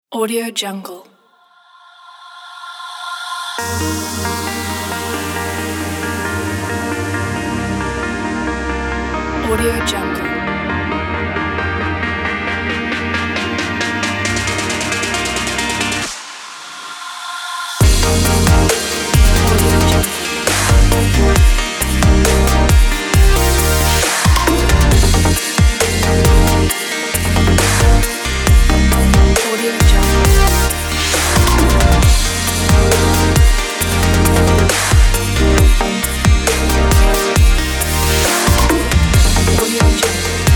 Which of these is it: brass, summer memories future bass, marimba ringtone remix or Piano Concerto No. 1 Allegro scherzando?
summer memories future bass